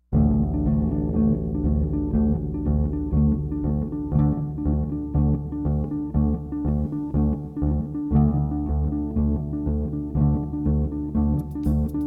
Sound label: Upbeat jam